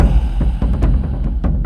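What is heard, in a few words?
Music Shield; From Dust; PS3; Xbox 360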